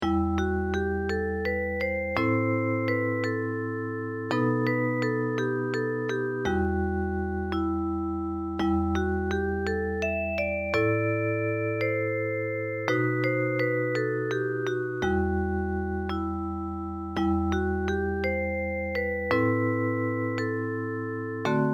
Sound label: prelude in e minor